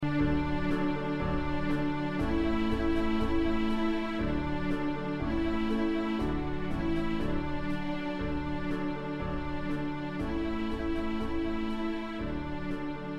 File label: alla marcia